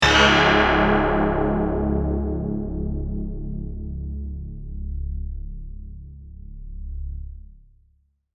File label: laser harp